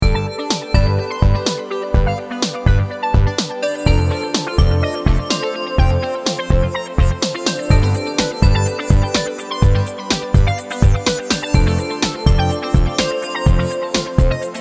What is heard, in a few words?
Music; Wheler